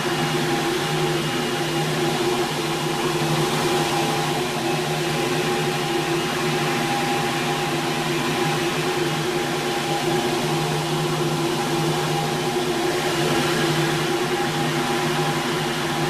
Assembly Conveyor Move Close; Boomtown; Titanfall 2

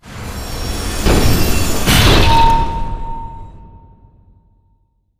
Prop Warehouse Cargo Elevator Down Stop; Titanfall 2; Boomtown

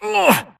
grunt hits; Titanfall 2

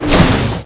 arc cannon lower; Titanfall 2

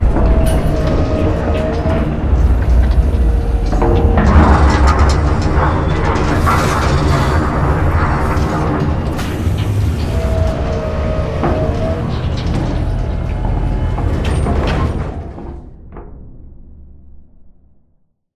dog whistle pulse fail mech med dist; boneyard; Titanfall 2

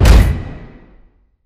pod door sm verb close start; Titanfall 2

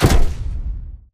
footstep generic close; Titanfall 2

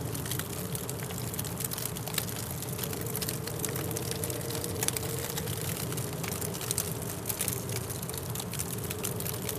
fire loop small crackle; colony; Titanfall 2

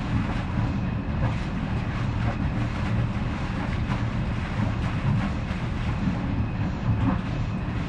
swampland pipe; Titanfall 2

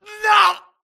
grunt death quick; Titanfall 2

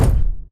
Footstep Jog Mid; Titanfall 2

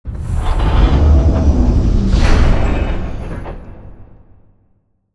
Robot Arm Final Station Down Close; Boomtown; Titanfall 2

